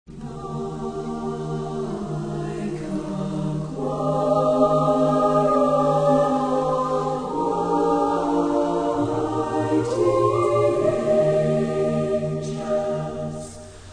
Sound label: Choir And Orchestra